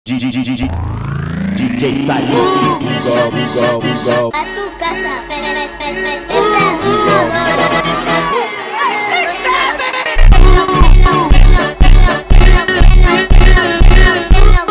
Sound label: Phonk FUNK